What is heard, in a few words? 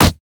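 sad mummy basic attack; LoL; amumu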